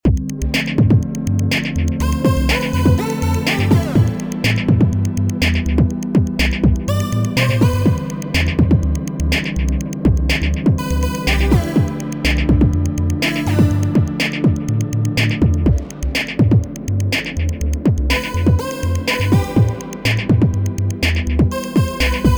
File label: bee beez BUZZ